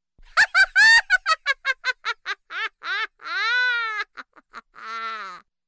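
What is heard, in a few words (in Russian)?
весёлая молодая ведьмочка; Для Хэллоуина; звук смеха ведьмы; Коварный злой смех старой ведьмы, забавный смех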